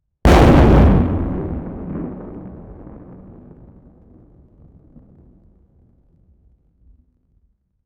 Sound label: 16bit thunder impact, 16-bit